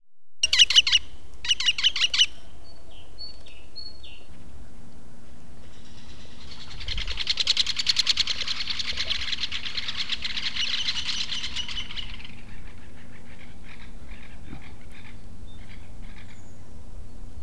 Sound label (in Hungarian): balkáni fakopáncs hang